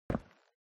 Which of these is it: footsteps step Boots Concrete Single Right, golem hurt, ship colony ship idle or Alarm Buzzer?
footsteps step Boots Concrete Single Right